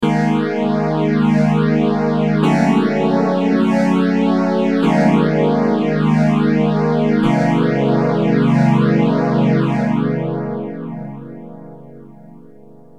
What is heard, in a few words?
m1000 multivox